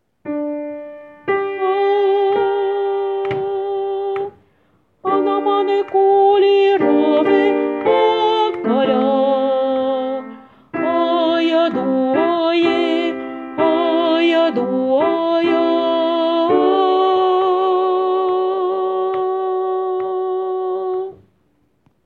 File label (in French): Alto voix au milieu